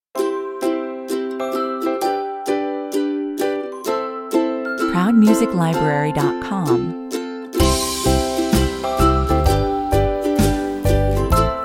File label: easygoing commercial, 129 bpm